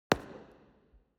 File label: object banger explosion hollow